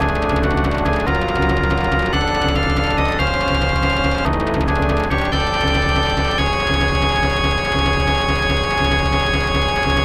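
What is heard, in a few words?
background music; Strider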